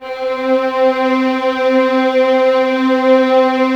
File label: C 4, Violins Exprsive, String Ensembles; Miroslav Vitous; 11 Violins